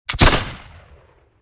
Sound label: classic gun shot; out of reach treasure royale 2020 gamerip pc